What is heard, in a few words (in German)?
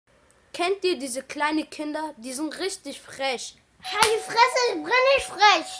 dummes Kind schlägt Kind